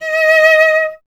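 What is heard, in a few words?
strings cello D#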